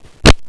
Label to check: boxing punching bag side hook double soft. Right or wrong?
Wrong